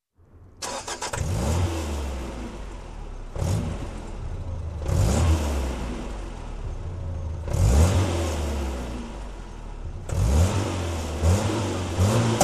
SUV engine ignition running

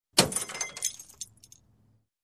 Drinking Glass bursting

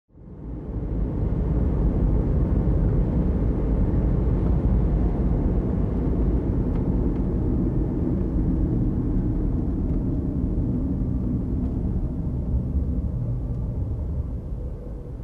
Car driving and parking from interior

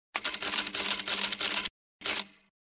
Printing label

